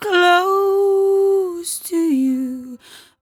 57bpm Am, Velvet Vocal; Zero-G

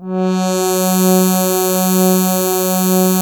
SYN Space Wave; Best Service Gigapack 1

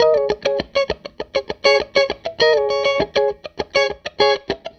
Strat Guitar Loop

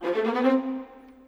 Viola, Orchestral; Sonic Foundry (Sony Creative Software); Orchestral VOL-2; Modern 12

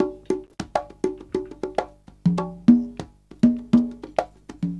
con, Percussion; Big Fish Audio - DOM

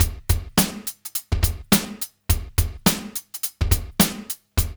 DRUMS; East West

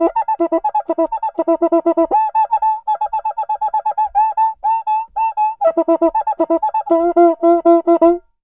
Cuica Samba 120 bpm; Best Service; Festa Latina VOL-1

